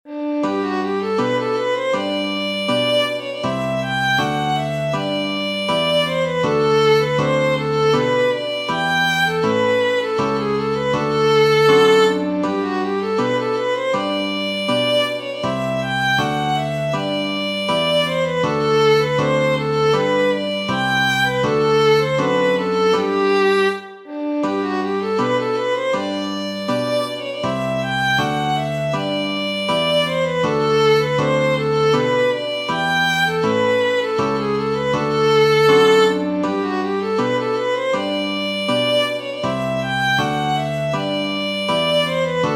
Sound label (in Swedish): Marsch G-dur